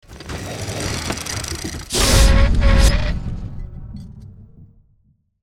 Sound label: Heavy giant robot footsteps single step; robotic